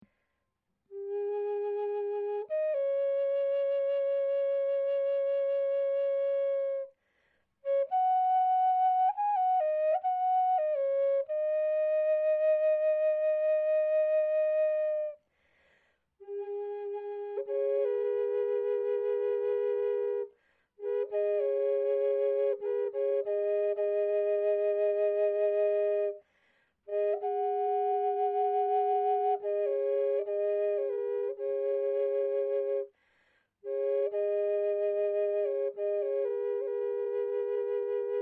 Drone Medium C Sharp, Native American Drone Flutes; Scales; Full Spectrum Scale